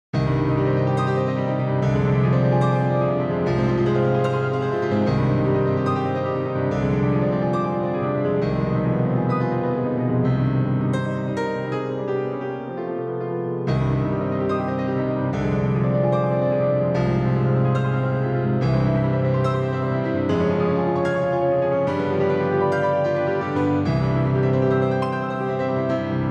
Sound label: Etude in C minor; Etude Op. 25 No. 12; Ocean